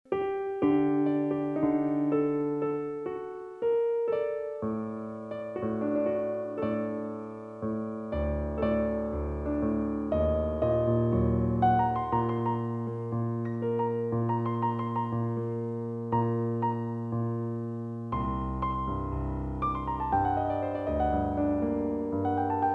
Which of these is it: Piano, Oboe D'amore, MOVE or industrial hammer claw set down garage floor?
Piano